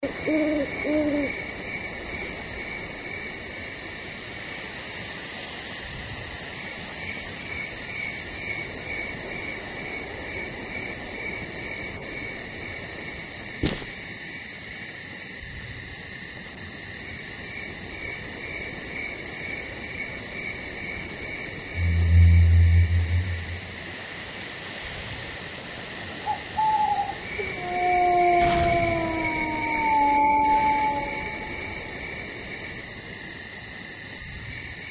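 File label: night sounds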